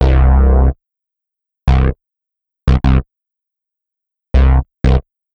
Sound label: rap; Bassment